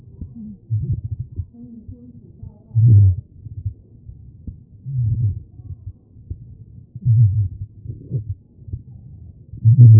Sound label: CHEST pos4 B MODE